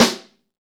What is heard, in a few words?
snare, Roland; Club-50 - Foundations Roland; Ext.Snare 2